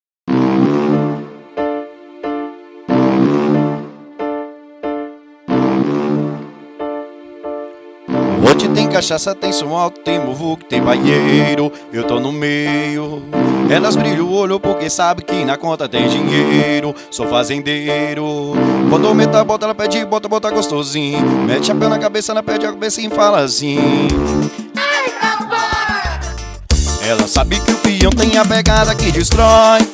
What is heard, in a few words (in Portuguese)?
forro ao vivo